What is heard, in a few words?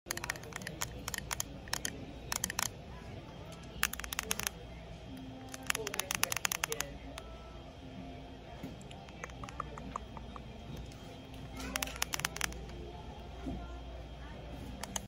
touch phone